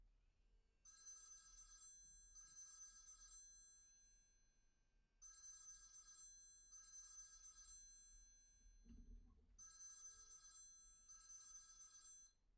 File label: phone; IEEE AASP